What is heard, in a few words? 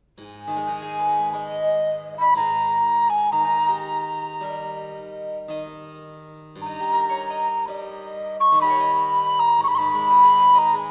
Chamber Concerto in A Minor, Largo; Il Giardino Armonico; Vivaldi